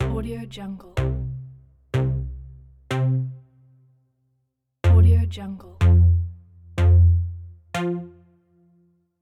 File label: synth countdown